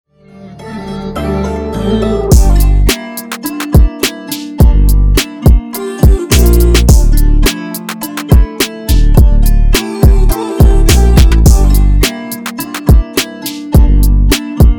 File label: trap madness bundle